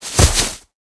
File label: Giant Red Condor die